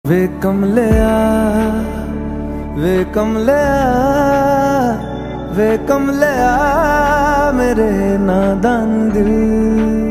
phone ringtone, mobile phone ringtone love song romantic song